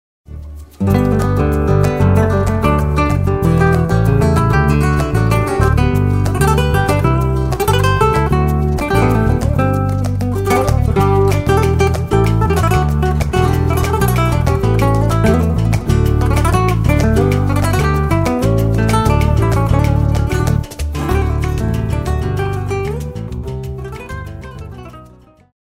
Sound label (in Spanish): Falseta, Flamenco